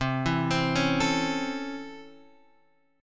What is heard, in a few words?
Db Minor Major 7th Sharp 5th C